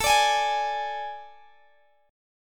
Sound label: A Major 7th Suspended 4th A, Guitar, Open G